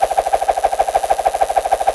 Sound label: shoot; cstrike; guillotineex